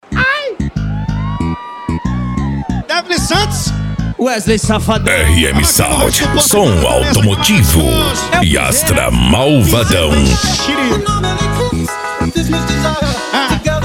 som automotivo

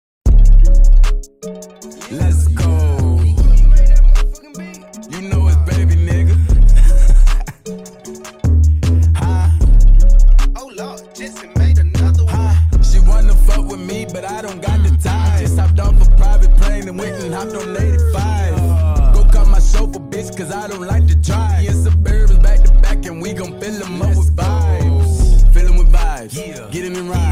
graves bass

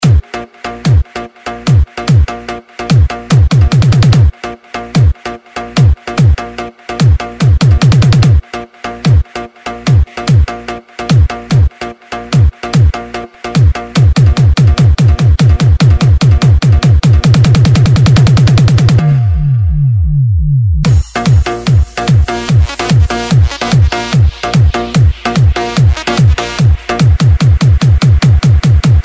esp mega funk bass

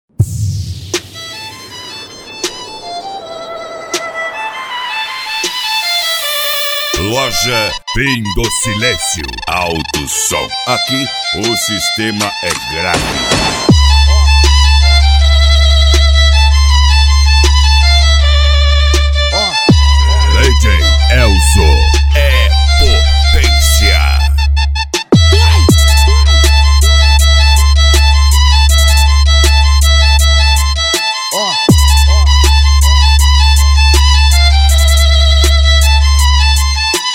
violino; fim do silencio auto som

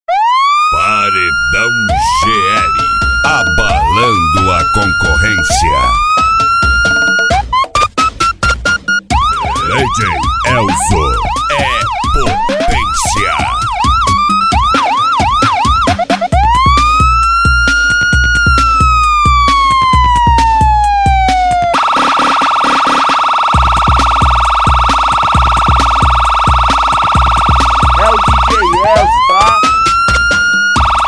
sirene; cd paredao gl vol 02 2023

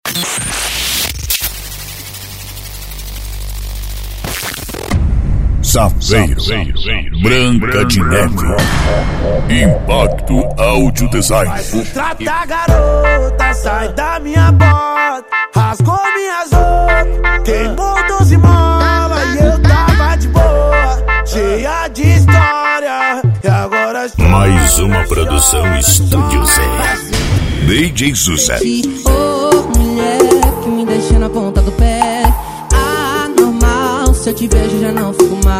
funk e sertanejo

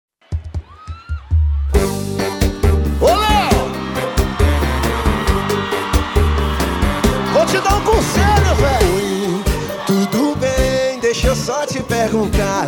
samba; cd bloco so nao gama 2023

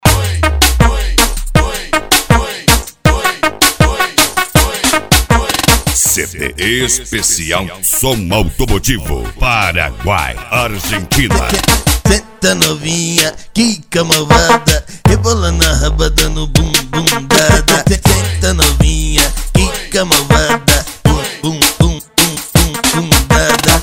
som automotivo

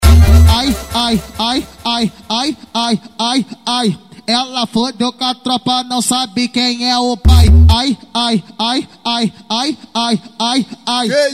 funk ritmado; especial cuiaba

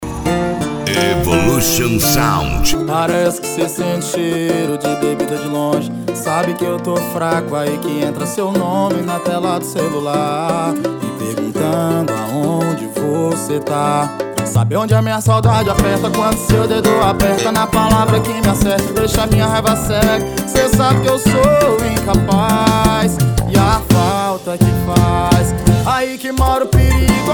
pagode

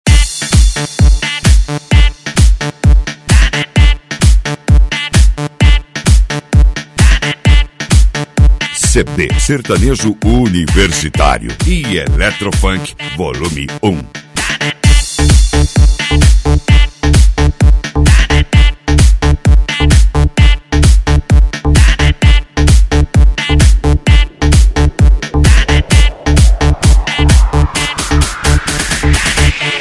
eletro funk e sertanejo

